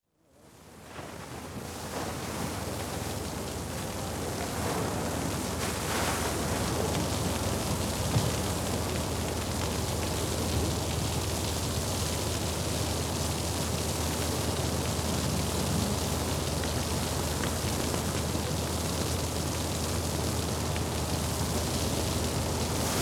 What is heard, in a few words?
Hoses Used On Fire (Distant Voice; Emergency; BBC Sound Effects Library